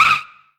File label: Brake; Pac-Man Championship Edition 2 Plus